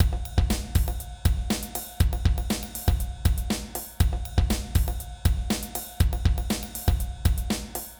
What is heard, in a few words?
Long Release